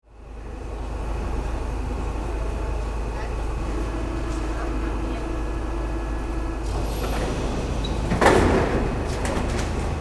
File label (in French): Souterrains Louvres parcours metro grande pyramide